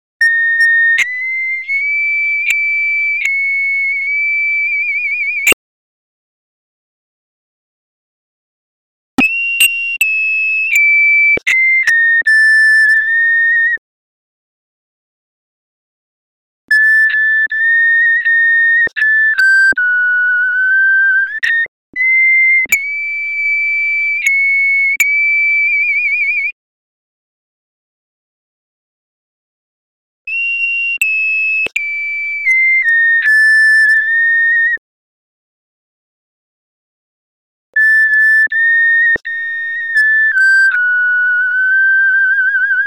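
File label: soprano; di Lasso; Quand mon mary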